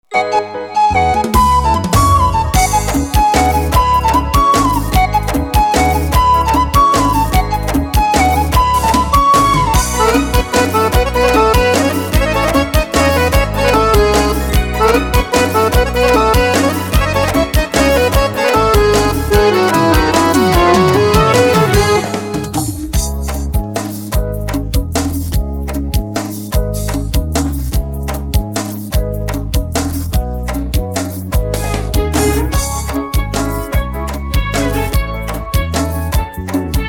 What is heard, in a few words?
instrumental, persian